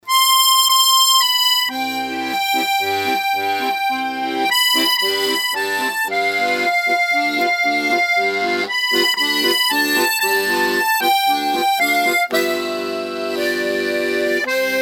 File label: accordion